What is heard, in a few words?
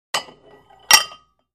Free Weights Exerci